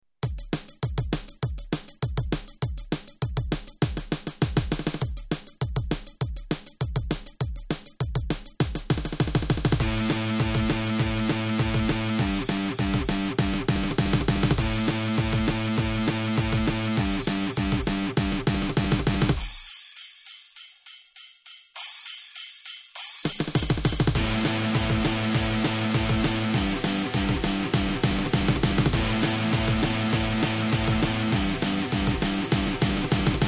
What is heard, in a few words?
Soft Rock Medley